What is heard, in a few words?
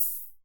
808 Hat Open; hydrogen drum samples